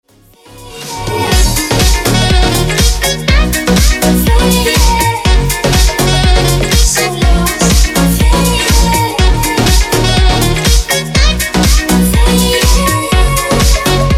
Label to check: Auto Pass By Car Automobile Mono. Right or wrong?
Wrong